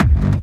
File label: Kick n Bass